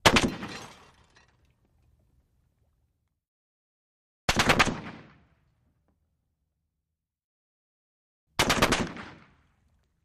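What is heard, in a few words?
AK47 Short Burst Debris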